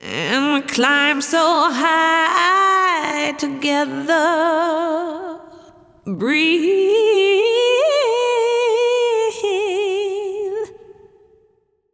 airy vocals unprocessed

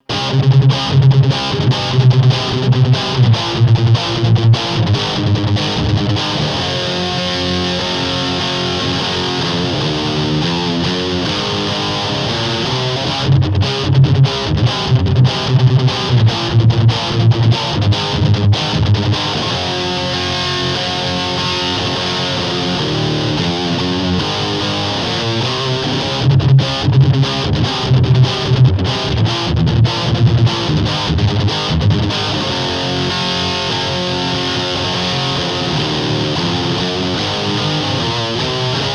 High Gain, MultiFX; iRig UA

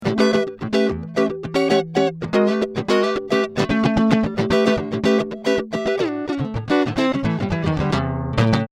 Twin Reverb Funk; fender

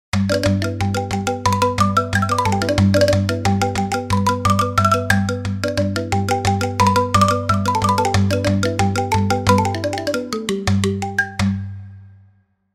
T-Rung Vietnamese xylophone; SampleTank 4

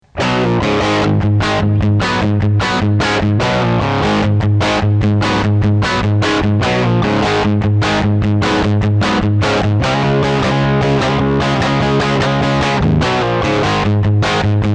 Rock, MultiFX; iRig UA

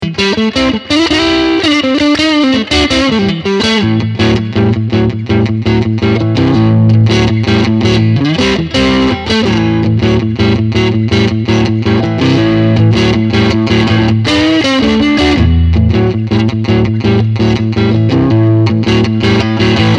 Hot Vibro King, fender

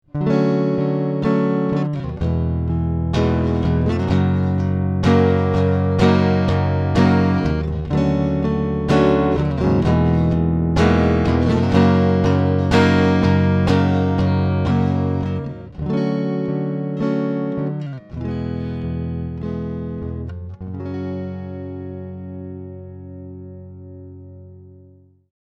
57 Deluxe and Spring; fender

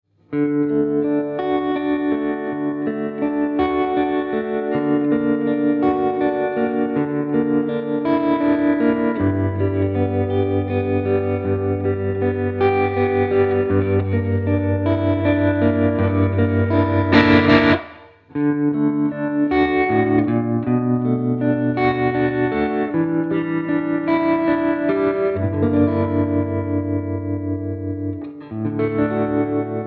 VIBE TREMOLO; xgear

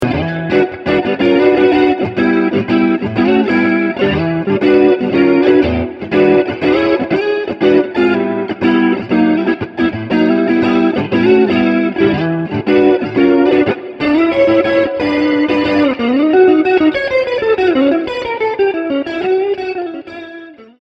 Blues Vibroverb + Vibratone; fender

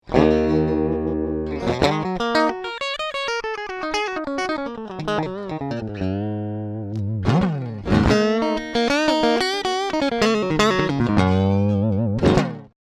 High Gain Super Sonic unproc; fender

